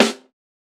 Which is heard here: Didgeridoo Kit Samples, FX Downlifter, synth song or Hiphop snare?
Hiphop snare